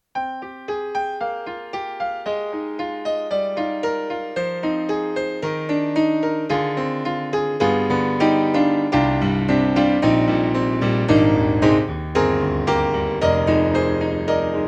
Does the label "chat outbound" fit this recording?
No